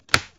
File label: card play; Embalming Girl